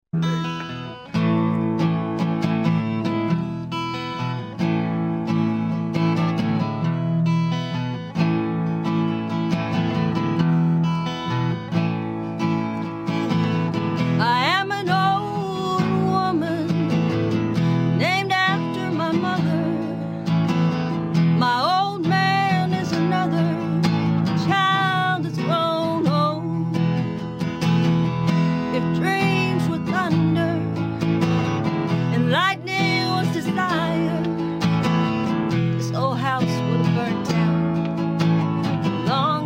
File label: Twang Ditty; Froggy Radio